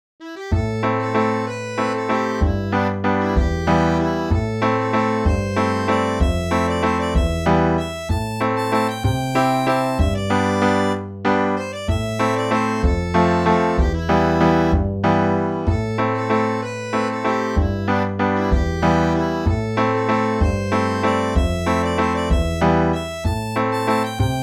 balade finlandaise accordeon

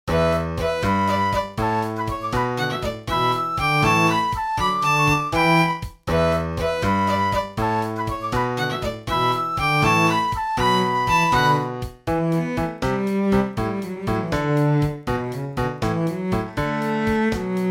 batterie simple

